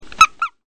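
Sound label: SQUEAKER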